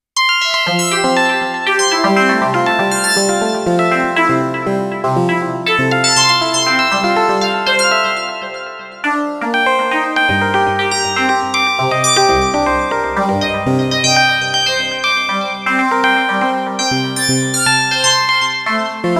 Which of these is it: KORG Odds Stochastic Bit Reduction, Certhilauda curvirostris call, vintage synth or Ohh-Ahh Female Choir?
KORG Odds Stochastic Bit Reduction